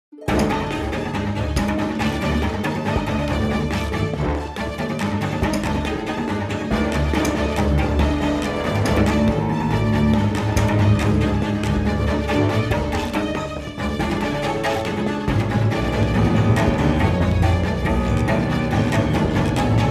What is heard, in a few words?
Loop Fight